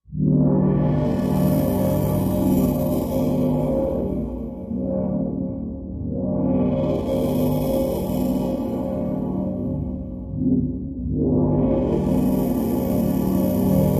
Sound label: Dream Train Low Pulses, hollow